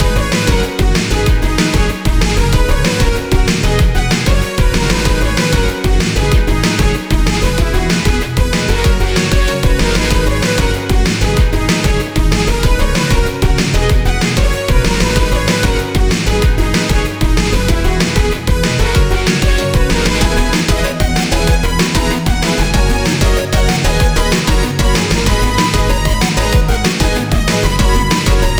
Opening Stage Loop

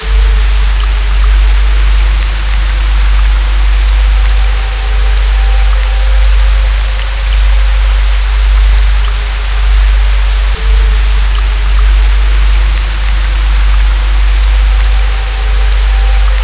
Haunted Rain; luigis mansion dark moon; 3ds switch

